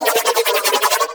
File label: Nunchaku Prime Quick Spin Whoosh; Weapons; Warframe; Tenno